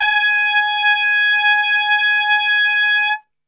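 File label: G#3 trumpet straight, brass; Best Service; Brass Super Section; TRP STRGHT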